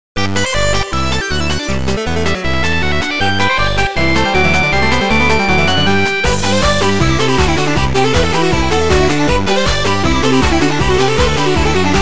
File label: remix